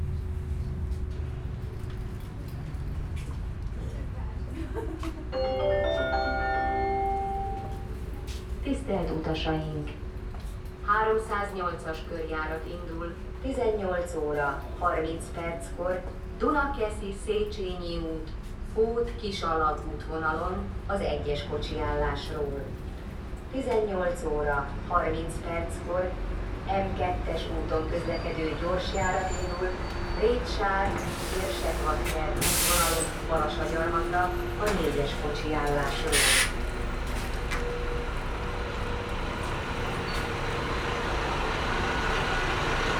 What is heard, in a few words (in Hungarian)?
hangosbemondó és busz indulása; Újpest Városkapu; busz távolsági